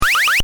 Retro SFX loop, Retro Style Loop, Generic Game Style; Tech; Stuart Duffield